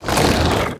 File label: Coffin Sink In Ground; SFX Kit; Foley - Misc